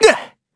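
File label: Vox Damage, Korean; Evan; kingsraid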